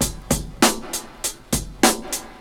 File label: Old School Break, Drums